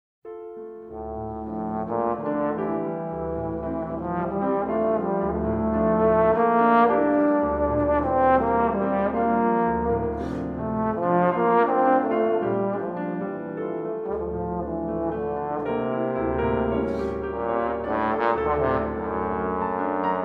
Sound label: Sonata In D Minor For Cello And Piano, Allegro Ben Moderato